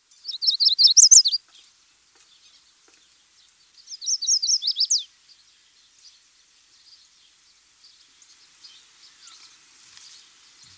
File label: Yellow Warbler; Birds